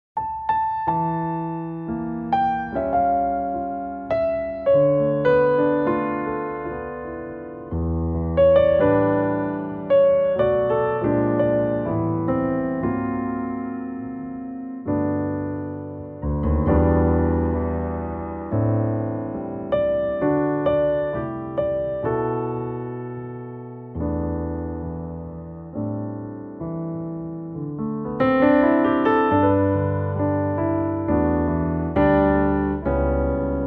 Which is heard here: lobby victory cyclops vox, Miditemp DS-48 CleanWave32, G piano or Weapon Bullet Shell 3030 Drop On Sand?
G piano